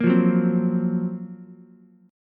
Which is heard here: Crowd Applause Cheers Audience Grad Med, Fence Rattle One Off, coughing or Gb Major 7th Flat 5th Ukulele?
Gb Major 7th Flat 5th Ukulele